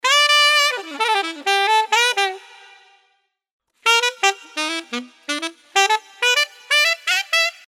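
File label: 126 Em Reverb Sax Loop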